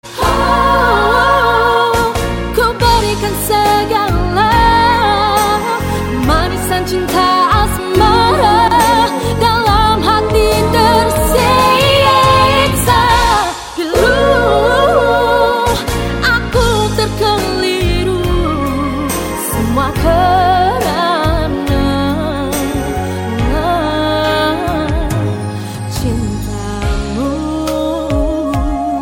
chorus

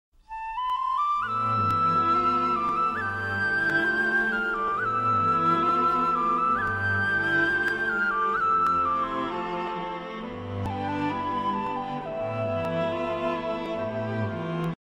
flute; inuyasha

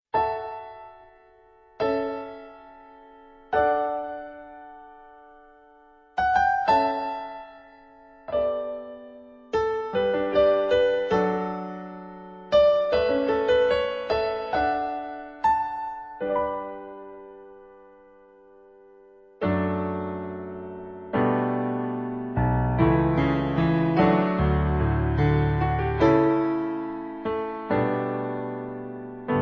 romantic

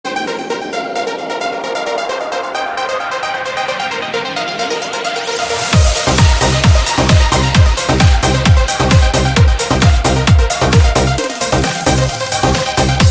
dj remix

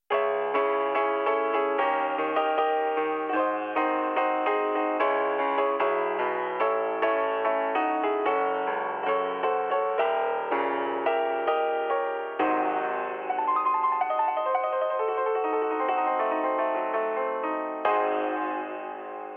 gothic piano solo

